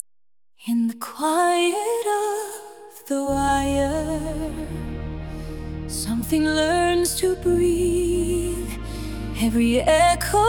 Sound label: sung chorus